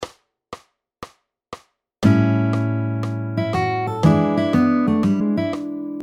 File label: Blues & R&B Style 1-5 Lick - Key of A - 120bpm